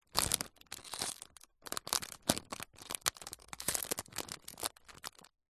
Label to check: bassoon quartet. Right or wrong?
Wrong